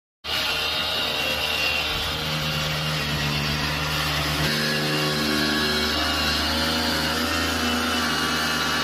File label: Polishing machine